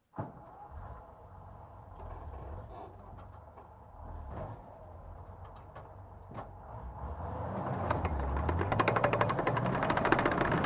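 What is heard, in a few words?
M10 tank onboard start fast drive stop off tracks right; Sonniss GDC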